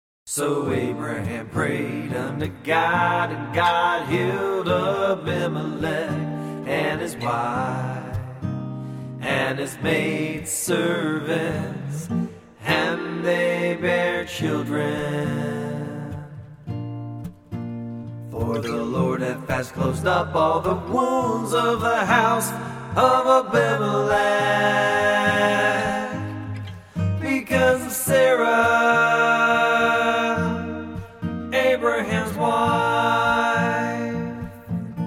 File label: Acoustic; Genesis 20 17-18